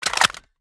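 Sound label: magout, gun; gerosa; daffas arsenal; tacz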